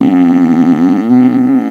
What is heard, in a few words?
farts